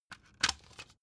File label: cd cover open tonal